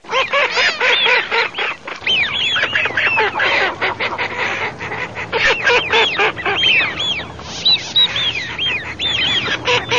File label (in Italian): fischioni